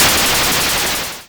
cluster, explosions

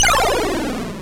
falling

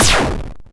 hard, explosions

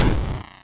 odd, explosions

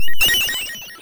bling

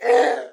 screams human

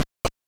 stairs loop; movement

